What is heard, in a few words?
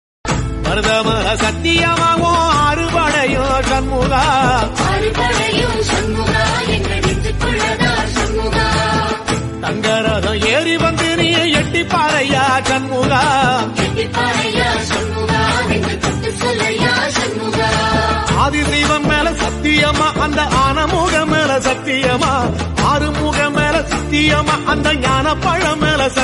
Bhakti Tamil Song; ringtone